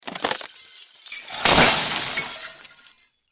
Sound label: Crash Chandelier Glass Light Fixture Smash; Crashes; Sound Effects:Objects; Blastwave